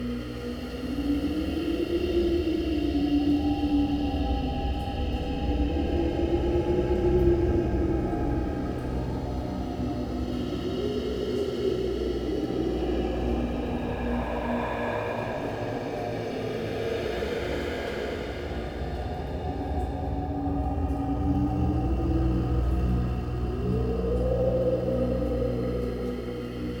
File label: Cave Shrine Amb Loop, ambience; Midrath Island